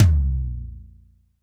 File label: TD-20 Tom A, Roland TD-20 Toms